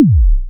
Kick, Korg Z1

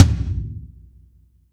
TOM G4 LOU L; Zero-G; Chemical Beats

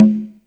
PC, Casio CTK-5000

